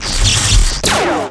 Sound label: dragon attack